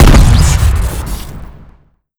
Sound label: trigun shoot crit; tf2classic